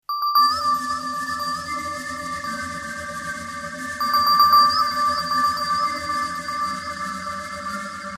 beautiful msg tone

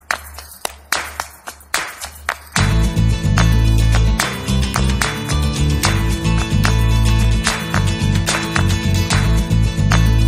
BGM; MIRZAPUR Walk To The Throne; MIRZAPUR Guddu; MIRZAPUR Season 2 Climax

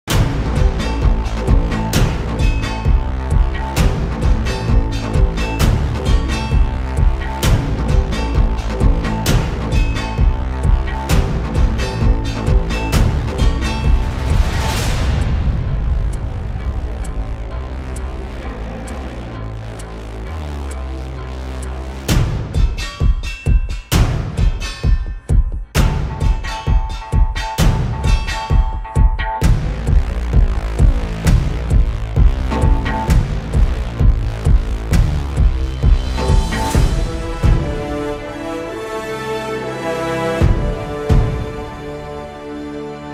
Theme BGM; Mirzapur; Ringtone

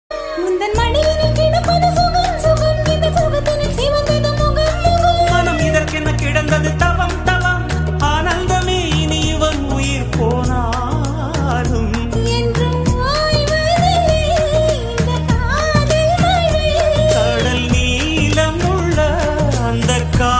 Love Ringtone

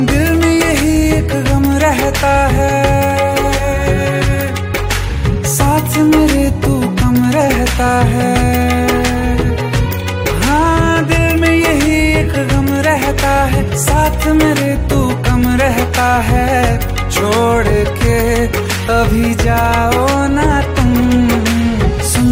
Dhun Tones

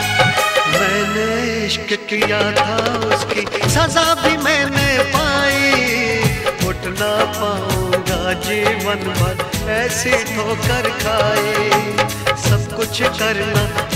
Sad Love Song

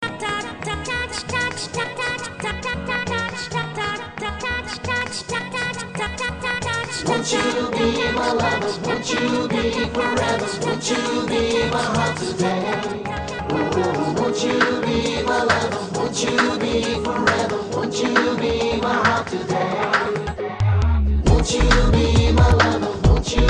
Bengali Song Ringtone

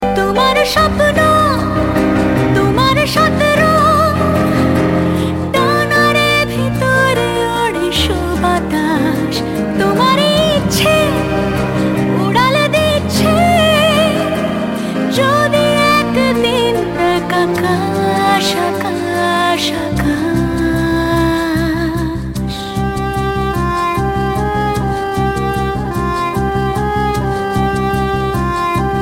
Song Ringtone

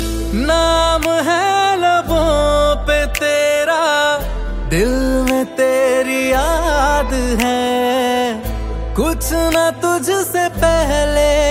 Song

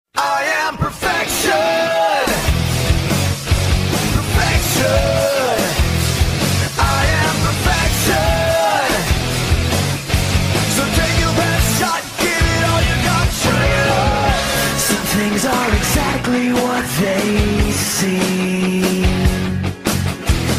theme; wwe

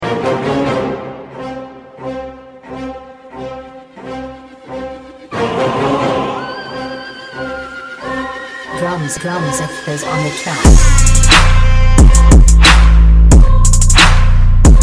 BGM Remix Tone; Mankatha

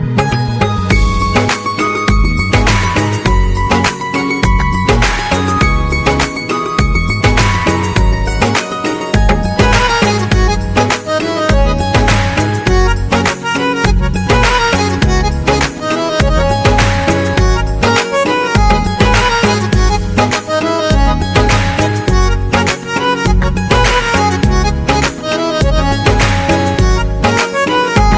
Song Instrumental Tone

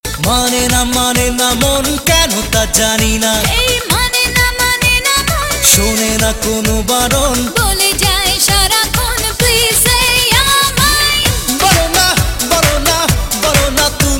New Bengali Song

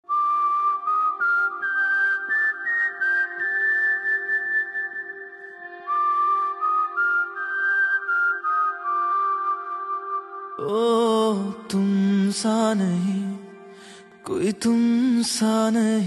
New Hindi Song

